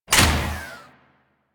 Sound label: Crp Ship Fighter Platform Stop B; Ambience; Props; Warframe; Corpus Ship Remaster